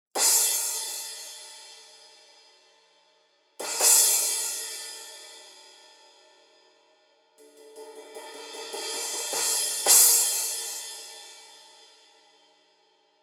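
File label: Resonant Crash 15, cymbal; Masterwork; Masterwork 15 Resonant Crash sample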